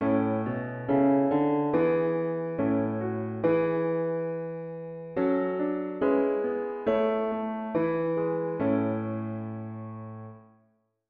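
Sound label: harmonic dictation diatonic inversion bass; Bach 141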